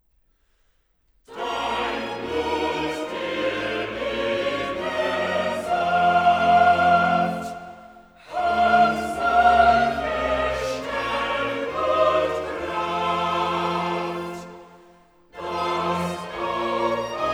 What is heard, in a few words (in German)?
Cantata, Choral; BWV 136 'Erforsche mich, Gott, und erfahre mein Herz'; 'Dein Blut, der edle Saft; Bach; Rendsburg; 8th Sun After Trinity